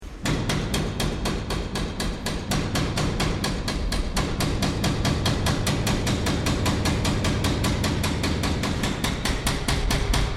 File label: Construction mobile jackhammer operation; Various construction machinery; SoundEffects Foundation2